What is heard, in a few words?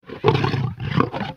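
Pets Triceratops Happy; creatures; Lego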